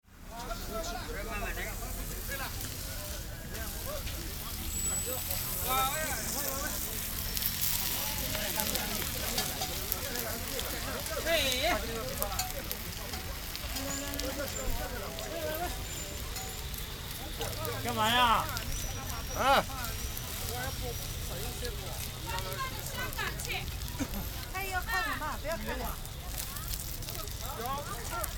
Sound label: frying